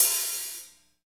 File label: HH, HH Kit St Rev